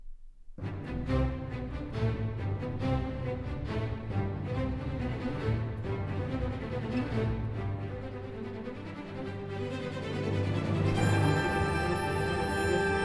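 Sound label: Orchestra; Minn Orcehstra; Rimsky Korsakov; snow maiden excerpt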